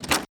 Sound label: open lock